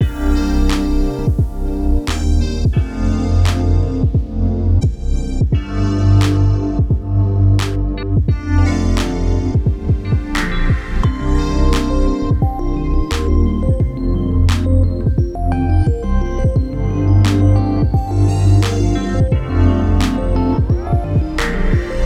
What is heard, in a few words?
bg C 87bpm